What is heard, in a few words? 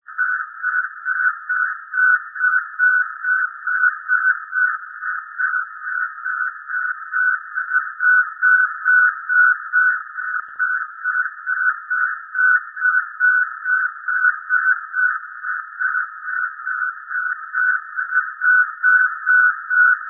Sputnik1 German Ham